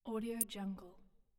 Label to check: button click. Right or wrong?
Right